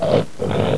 Boar Grunt; Mantra II v1.0

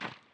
Step; Alexander

